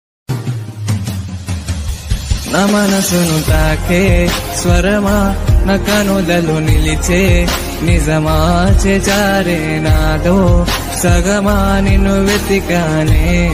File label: telugu best romantic bgm ringtone